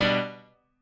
piano

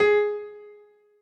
piano

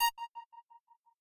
synth

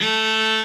cello

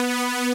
lead

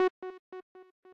arp

